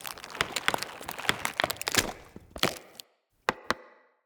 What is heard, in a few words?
Mockery Collapse End; Enemy; Foley; Mockeries; Characters